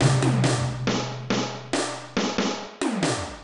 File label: Dark Industrial Loop Intro 139bpm